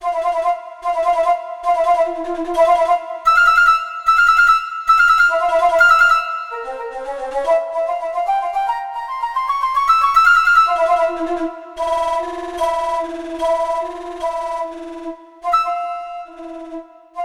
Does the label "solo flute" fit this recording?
Yes